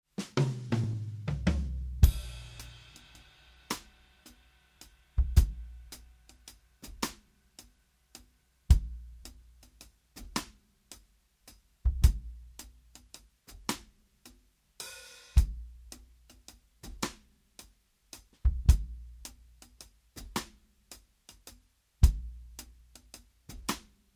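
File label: 70s folk rock, full mix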